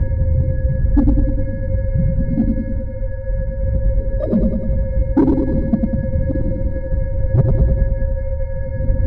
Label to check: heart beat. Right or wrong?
Right